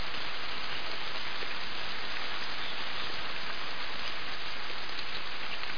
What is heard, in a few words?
rain; weather